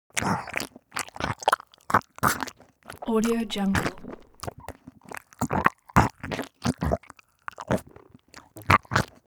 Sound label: human vocal death burble long